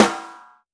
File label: ST AMB SN, STEREO AMB SNARE